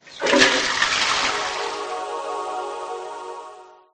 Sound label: flush, toilet; sfgame